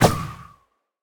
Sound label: breeze jump; mob; minecraft